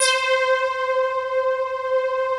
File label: PADS; Pro-Rec - Synthsonic; TR PADS